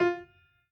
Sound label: piano hi